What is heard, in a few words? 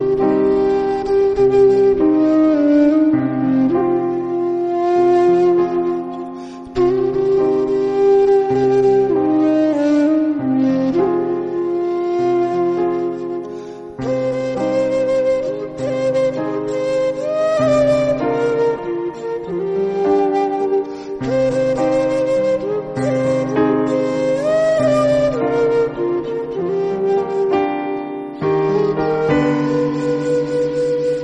tamil flute cover